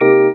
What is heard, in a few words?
TB ORGON OO, Talk Box